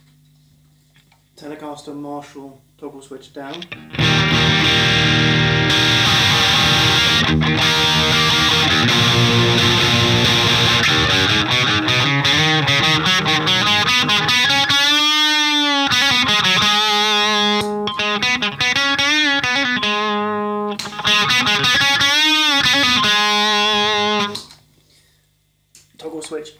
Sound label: TB Tele Marshall, Treble Booster; Treble Booster Mk II